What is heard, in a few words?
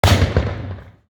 Firecracker Explosion, Firecrackers & Fireworks; SFX; IRL Recorded